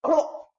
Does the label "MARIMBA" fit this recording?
No